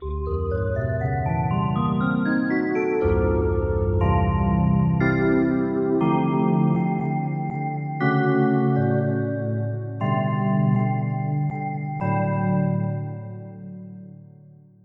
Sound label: Mellow Chorus, synth